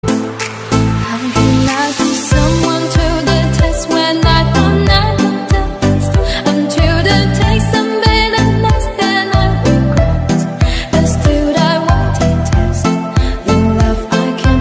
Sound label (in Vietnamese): Nhac Chuong Remix